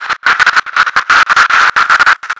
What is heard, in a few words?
data trans random, citadel; ambient; Garry's Mod